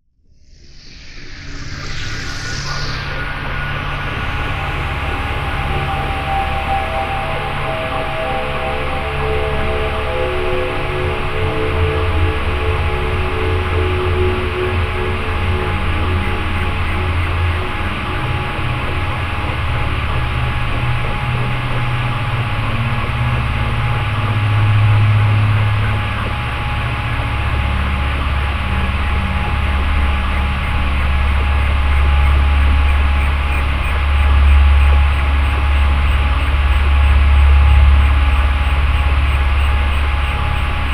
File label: rain in space